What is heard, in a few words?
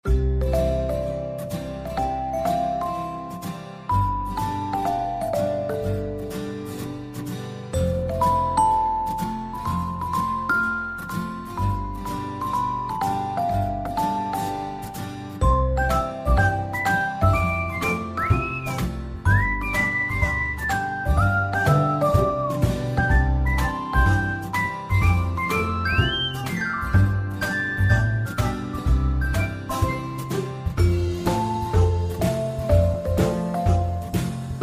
background